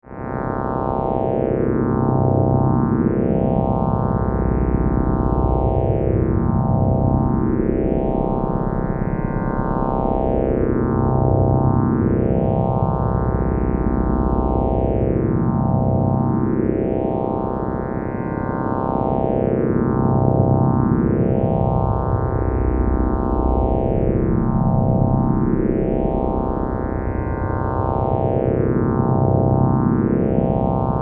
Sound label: Force Field Energy, Source; Science, Fiction; BlastWave